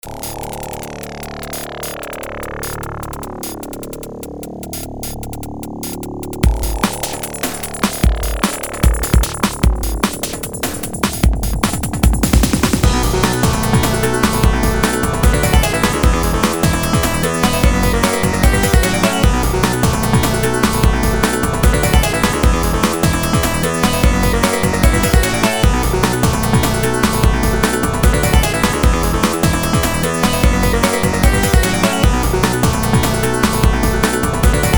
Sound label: FM Mix; SMS Power II; streets of rage remake